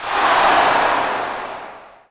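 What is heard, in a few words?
Boxing Crowd Large Reaction; Crowds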